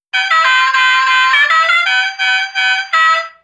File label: specialty horns; stereo